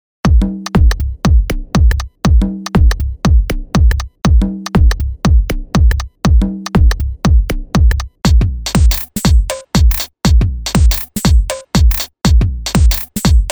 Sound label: minimal loops; gigaloops